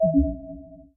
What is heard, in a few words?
Sent Message